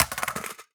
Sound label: phys mag drop ar metal concrete, magazine drops